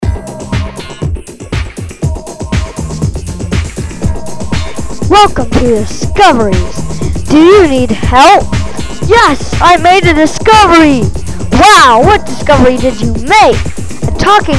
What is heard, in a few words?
jingle